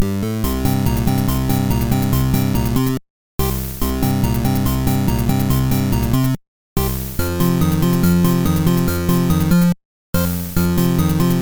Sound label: Game B Battle Won; Radar Mission; GB